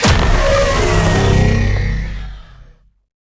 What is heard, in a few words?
megalaser fire